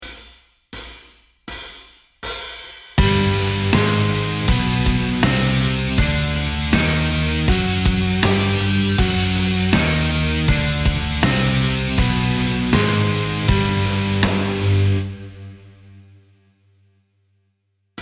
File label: Exotic Modes G Byzantine Double Harmonic Minor Relative Eb Major; metal music theory